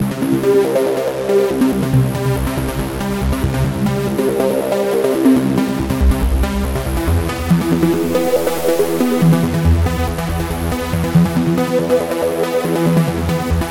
Evil Bassline

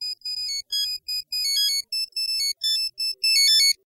Samba Old; Nokia

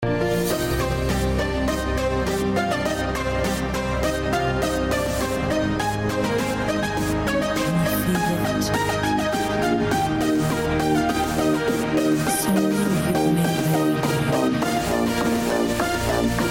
Sound Of Bass

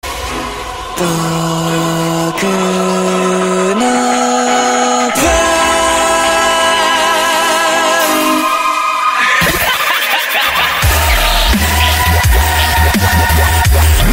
Utaite Dubstep

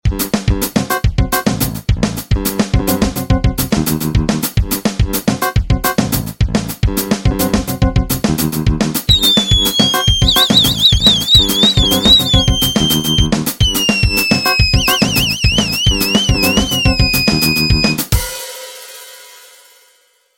Nokia Whistle

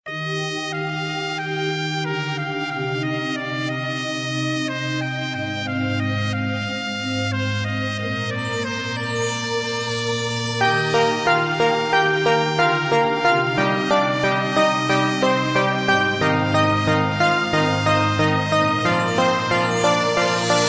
Trumpet

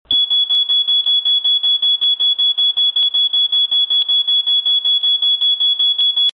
Smoke Alarm

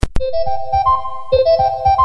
Corpuls C3

